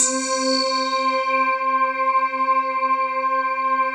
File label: PAD, ATMO PADS; Best Service; XX Large